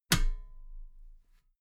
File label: metal ladder step soft